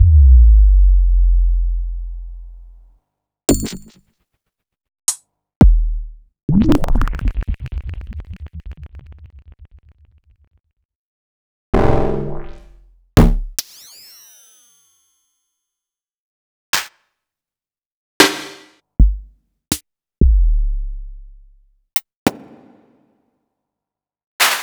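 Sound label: Roland MV8800 drum MACHINE KIT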